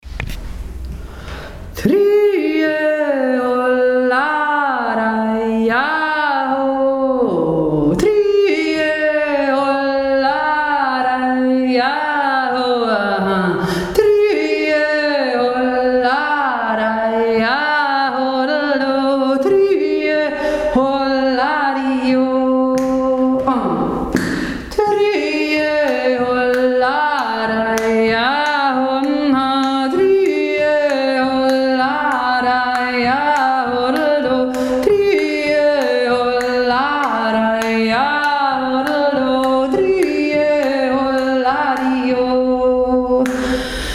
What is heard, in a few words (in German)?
schuldirndl jodler 1 st variationen